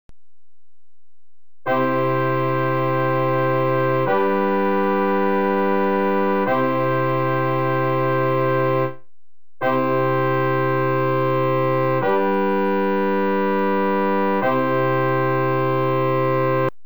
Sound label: Cadence, just intonation